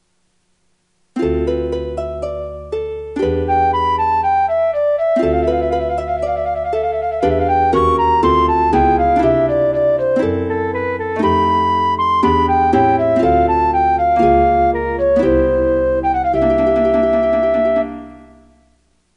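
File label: opening flute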